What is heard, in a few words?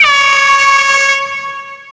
Air Horn R